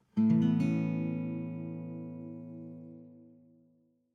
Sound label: chord